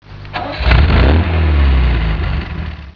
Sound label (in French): Démarrage de la MGB Weber; numérisation 8 bit